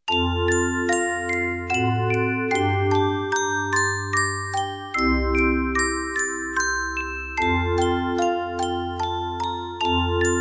handbell

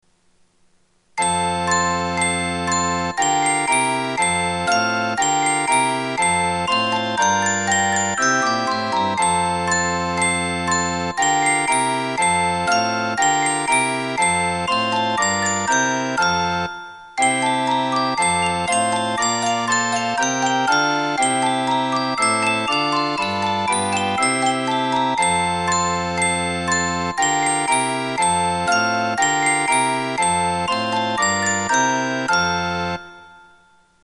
handbell

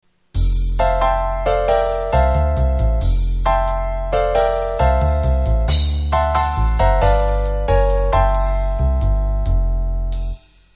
opening pf trio

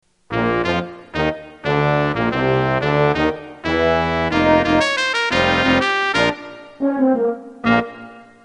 opening brass

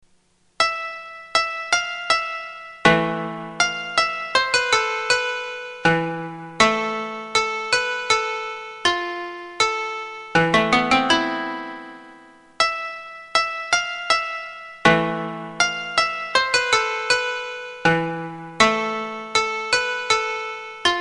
koto

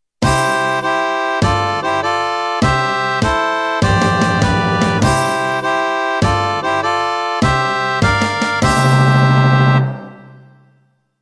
fanfare

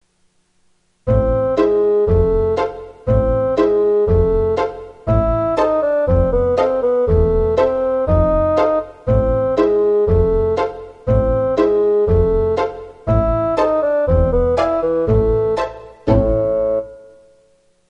opening pizz fag

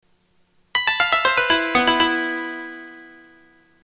opening koto

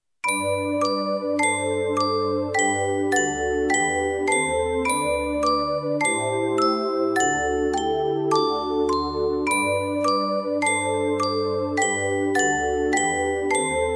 handbell

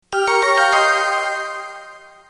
alarm

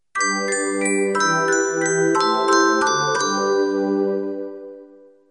bells

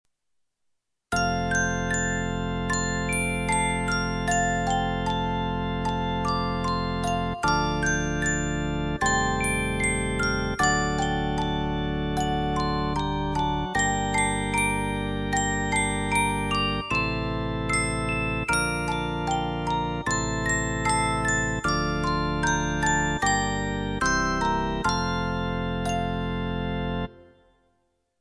handbell

